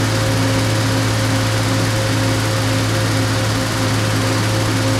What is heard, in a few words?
loop exterior engine loop, engines tanks; War Thunder